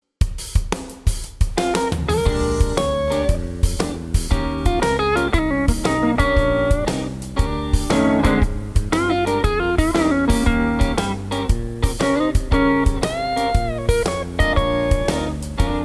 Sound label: Blues in Bb